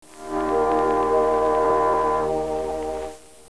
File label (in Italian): suonerie musica hawaiian